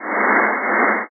broom

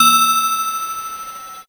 handbell

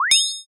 draw sword

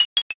ice glass

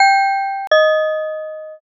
pinpon bell

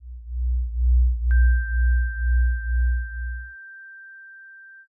eerie ambient

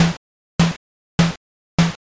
tatami step

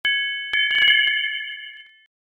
shrine bell

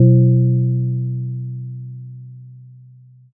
gong

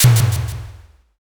pistol

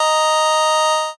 organ

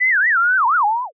rewind time

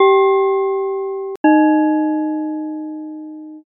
pinpon bell

